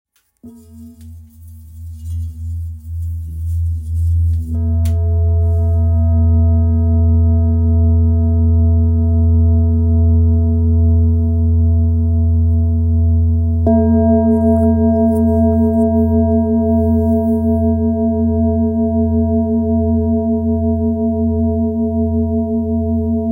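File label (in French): Bol ramgandi mi, bol chantant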